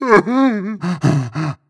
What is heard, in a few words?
breath long, zombie